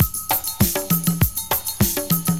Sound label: Drum loops; Just Add Drums